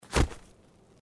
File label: footsteps snow